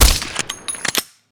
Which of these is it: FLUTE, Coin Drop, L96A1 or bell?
L96A1